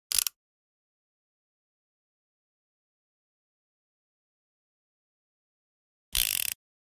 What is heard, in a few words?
.357 Pistol Chamber Spin; Hollywood Edge; Heavily Armed